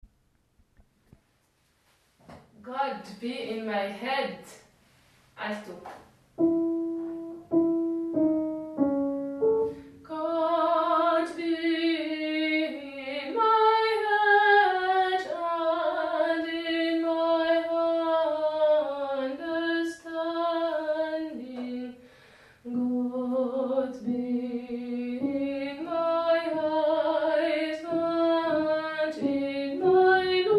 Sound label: alto; God be in my head